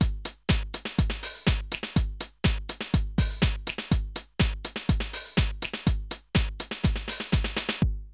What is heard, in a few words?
drum loops, House & Garage